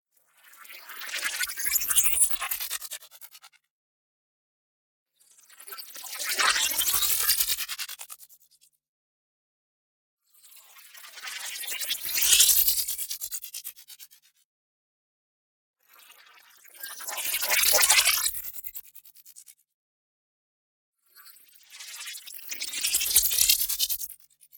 NEON WHOOSH SMALL SLOW Data Stream; boom library

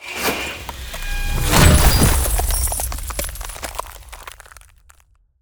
Ice Spell Explosion Impact; Winters Embrace; Arctic Aura; Ice Explosions & Impacts

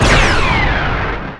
Rocket Launcher Shot; SFX Kit; Weapons

